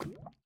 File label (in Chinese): 滴水石锥熔岩滴落声